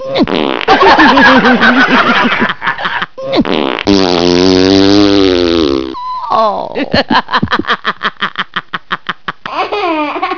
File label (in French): très rigolesque